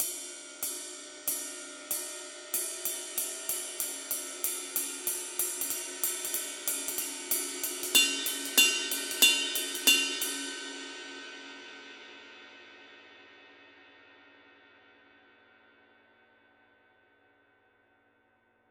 formula 602 classic sounds 24 medium ride pattern

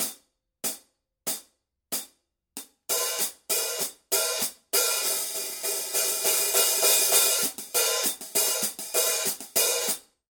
14 medium hi hat pattern; formula 602 classic sounds

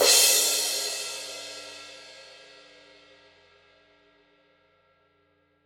17 crash edge; signature precision

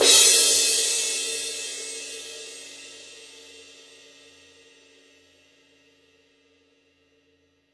18 reflector heavy full crash edge; Forgées à partir d’un alliage secret de bronze; signature; paiste

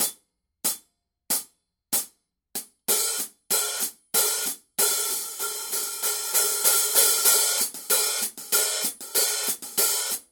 signature 14 dark crisp hi-hat pattern; Forgées à partir d’un alliage secret de bronze; paiste

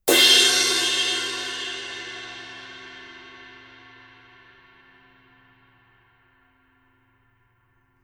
formula 602 19 crash edge; modern essentials

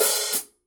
signature 14 dark crisp hi-hat open; Forgées à partir d’un alliage secret de bronze; paiste

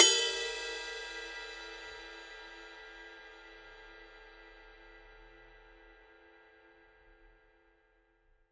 20 full ride bell; Forgées à partir d’un alliage secret de bronze; signature; paiste

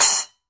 signature 10 reflector splash choke; Forgées à partir d’un alliage secret de bronze; paiste

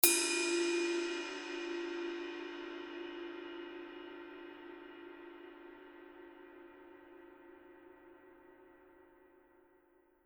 formula 602 24 ride body; modern essentials

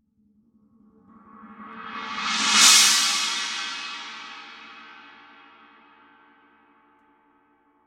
formula 602 18 china roll; modern essentials

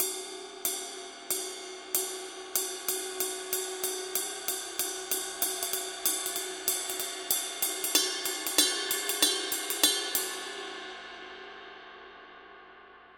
dark energy ride mark i pattern

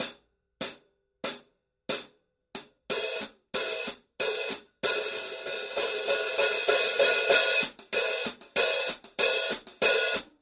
14 medium hi-hat pattern; Forgées à partir d’un alliage secret de bronze; signature; paiste

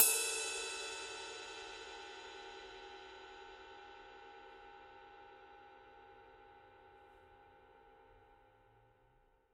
20 full ride body; Forgées à partir d’un alliage secret de bronze; signature; paiste

